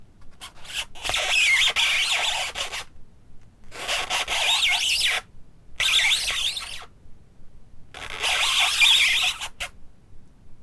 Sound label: rubber squeaking